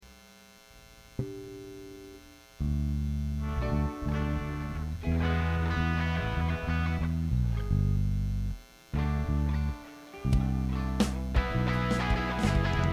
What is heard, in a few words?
Buzz and Feedback Mix